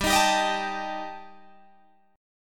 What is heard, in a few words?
G Suspended 2nd Sharp 5th, Guitar Drop D